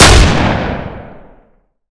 saw shotgun; weapons; ModernWarfare

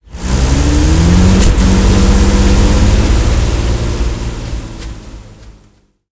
stug40 engine load, tanks; War Thunder

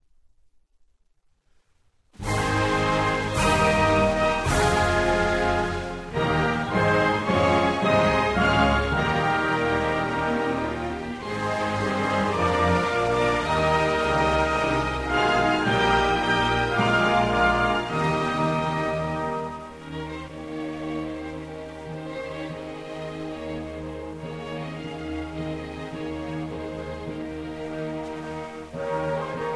Music; Canada; DCS

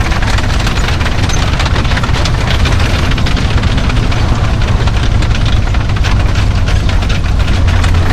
tracks, engines tanks; War Thunder; maus

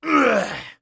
death; Escape from Tarkov

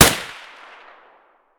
vpo209 fire distant silenced; Escape from Tarkov

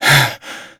breathing better; Call of Duty Modern Warfare

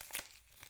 grenade smoke russian disarm; Escape from Tarkov

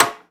Aircrafts MiG-15bis Ratchet; DCS

